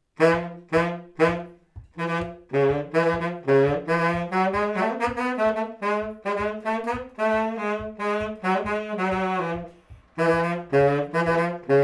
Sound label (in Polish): Polka